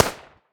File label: machine gun ak 47 single shot close perspective